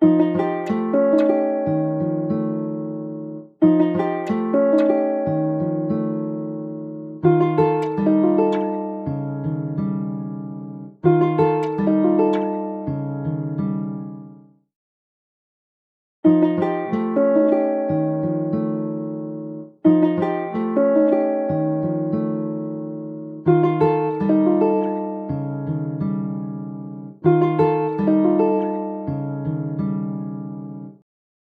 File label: guitar finger noise before after